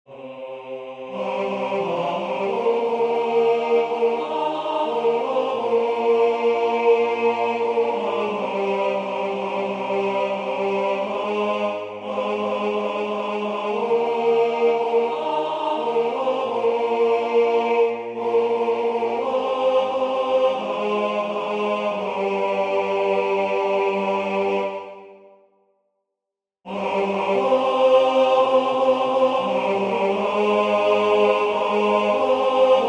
Regardez l'humilité de Dieu tenor

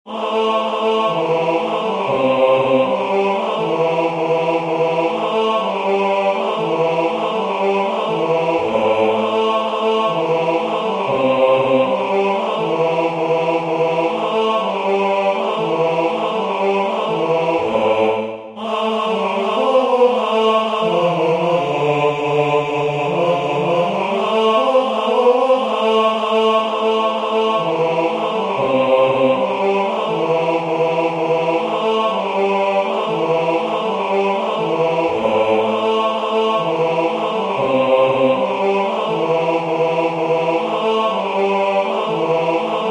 basse; Gaudete Christus est natus

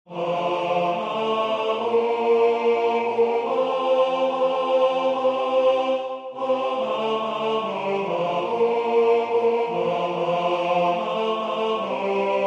Ne crains pas tenor

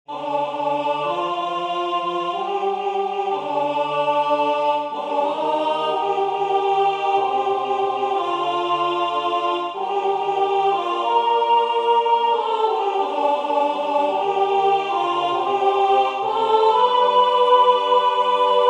L'Esprit de Dieu repose sur moi alto

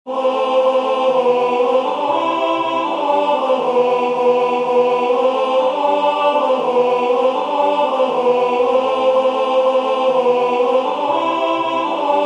tenor; Gaudete Christus est natus

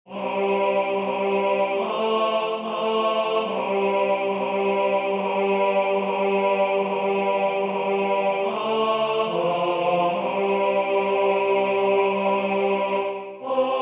Priere des freres moraves tenor

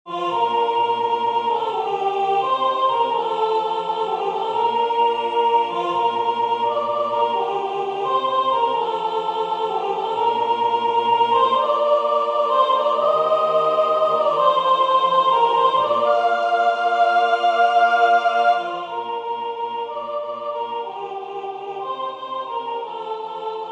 soprane; Venez bergers et bergeres

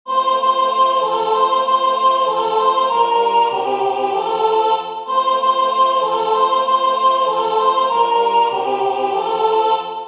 soprane; Venez mes enfants

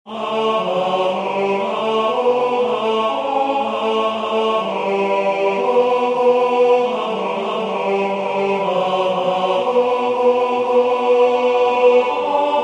Voici le Corps et le Sang du Seigneur tenor

